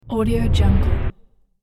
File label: trailer riser abrupt ending